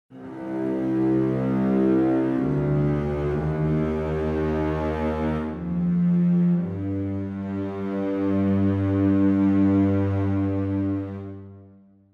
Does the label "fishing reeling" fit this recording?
No